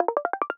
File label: Drill; finn's sounds